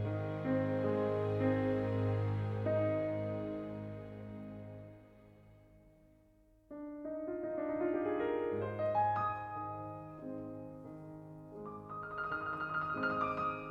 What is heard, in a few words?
Piano Concerto No. 2 in F Minor, Larghetto